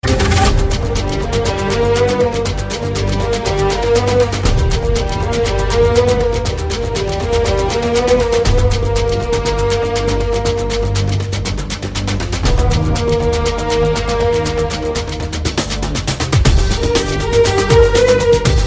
Theme Music

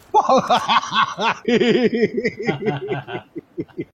Giggle; Pubg

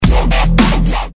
Text Tone; Vindicate

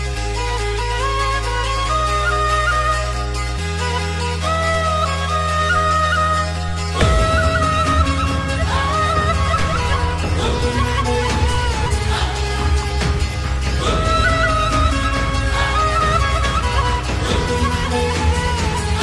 Dombira

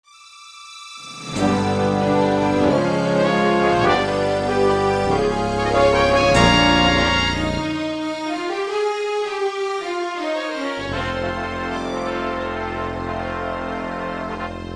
Fanfare

